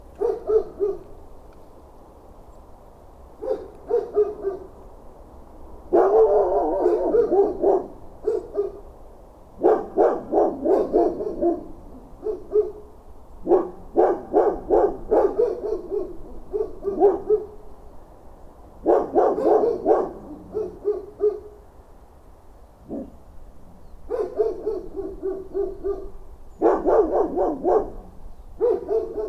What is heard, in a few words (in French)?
chiens